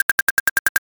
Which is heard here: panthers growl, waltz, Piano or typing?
typing